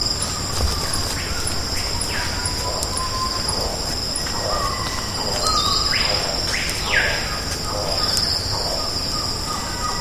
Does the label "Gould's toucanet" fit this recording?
Yes